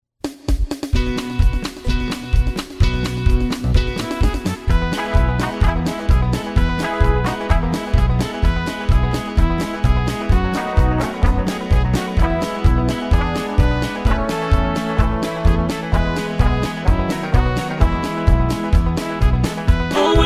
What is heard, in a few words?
harmony